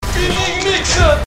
spinning mixer

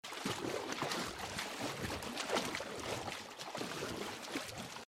action swim

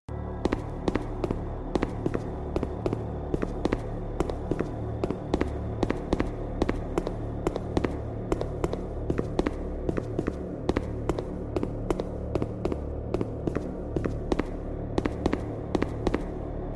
walking; scpsl 049

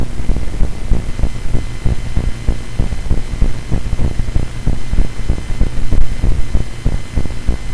noise from my microphone

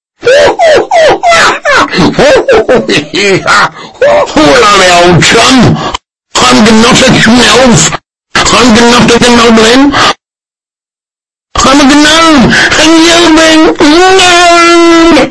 earrape; gnomed

